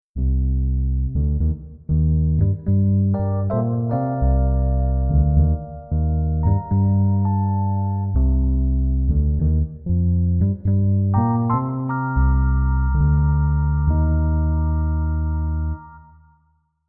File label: rhodes; Kerz